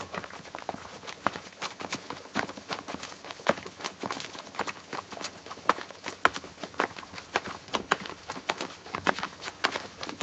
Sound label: sound effect